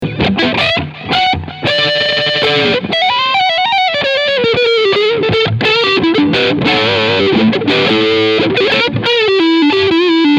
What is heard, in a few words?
Leadsound Front PU